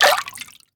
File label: Bird Exit Water; Angry Birds Rio